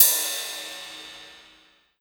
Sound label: Ride Hit Mid; K27 Trash